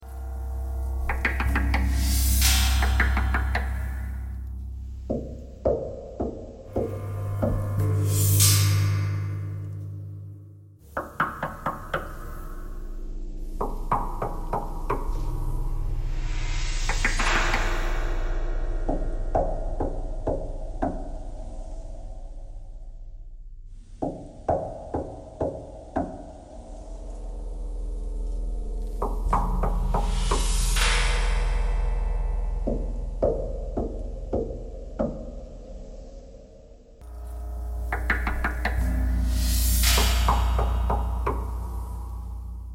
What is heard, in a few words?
knocking thread noise